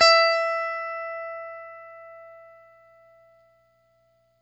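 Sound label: Stratocaster, Guitar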